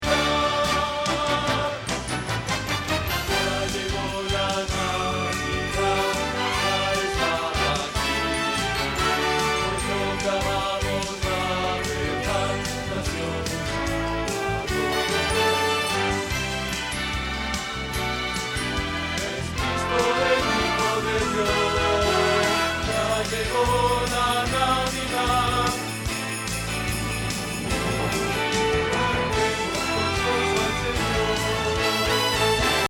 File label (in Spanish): Bajo